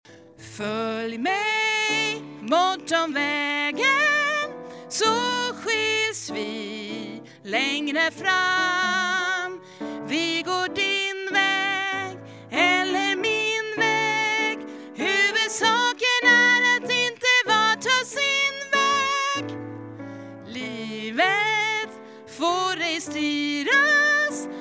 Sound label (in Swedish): sopran